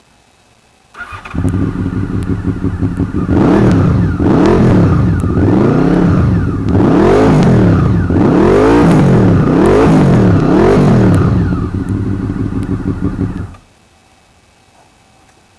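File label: vfr micron, motorbikes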